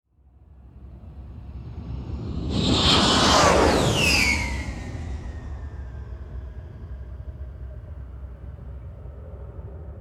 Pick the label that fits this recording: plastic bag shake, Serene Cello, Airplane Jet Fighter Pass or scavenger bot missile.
Airplane Jet Fighter Pass